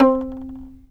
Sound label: fiddle pizz C4 L; Sampleheads; Whole Lotta Country 1 & 2